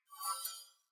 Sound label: Sword Whoosh Accent Long; Weapons; Player; Swords; Fey Sword